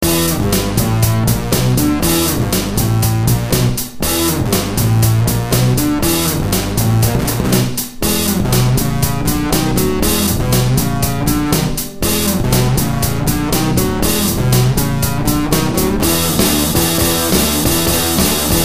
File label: funk samba